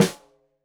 Snare Stereo, Drums; Deeply Sampled Drums

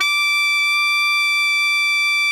alto FF D 5, saxophones; Greytsounds; Philip Wolfe David Crozier's Saxophones

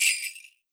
MIXED PERC; 90 s Sample CDs

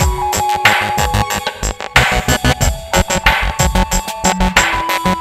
Beats, Synchro Funk; Finky Five; Sonic Foundry (Sony Creative Software)

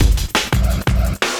Walk Break, breaks

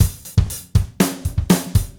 Gretsch groove 90 BPM, 1967 Gretsch Kit drums; 120 BPM; Sonic Foundry (Sony Creative Software); Vital Drums

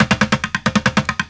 Street Groove, Ambient Grooves

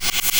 INSECTS, SYNTH INSECTS; Big Fish Audio - Alien Guitars

